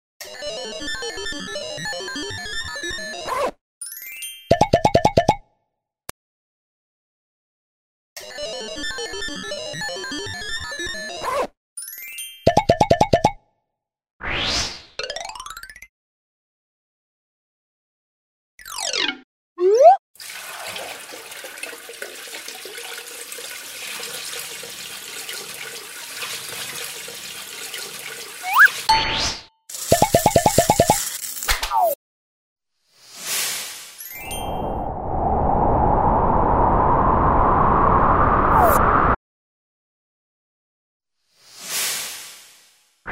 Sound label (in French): ANIMATION